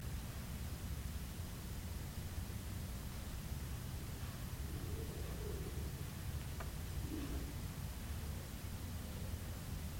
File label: laughing